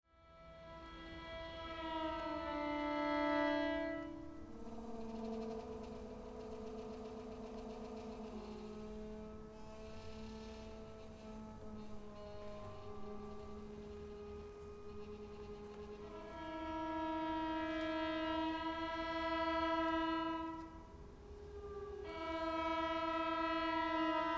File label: Violin